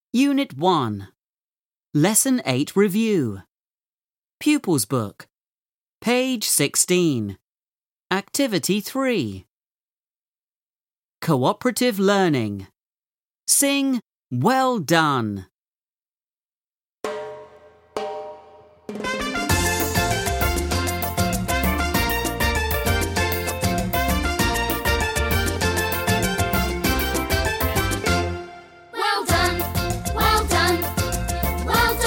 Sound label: Well Done Song; Give Me Five; Level 1